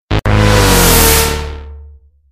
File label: player death sound; tf2; mann vs machine